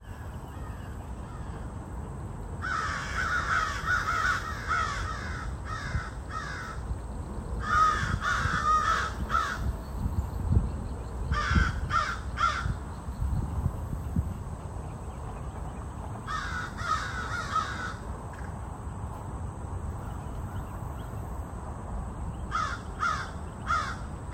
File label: crows harassing great horned owl, field recordings; scout island